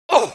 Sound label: damage, pain; mohaa; dialogue